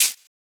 Hihat Closed

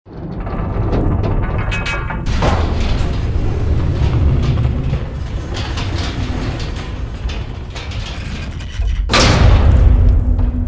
Graveyard Gate Open; Journey; Graveyard Ancestor

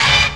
Boss Intro; MMX; X2

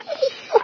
whine, dog; There; ThereClient

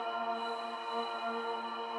floor switch loop; puzzle

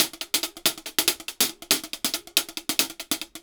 Hihat Mega Swing 140 bpm; Loops; Drums; Top; Ableton Live 12 Lite; Core Library

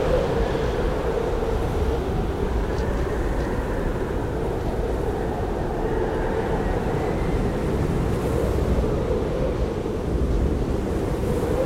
Mountain Driving Snowy Wind Ambience; Journey

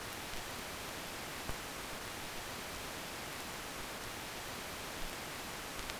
Noise Tape Floor; Loops; Texture; Ableton Live 12 Lite; Core Library

